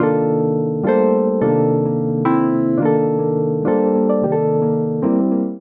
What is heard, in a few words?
Vamp Dense Five 5/8 C Minor 107 bpm, Electric Keyboards; Ableton; Factory Packs